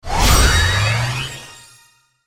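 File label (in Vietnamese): Âm thanh nâng cấp cấp độ trò chơi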